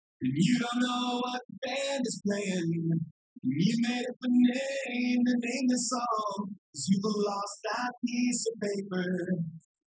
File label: wdrums; SiSEC10; underdetermined